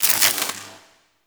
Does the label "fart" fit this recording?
No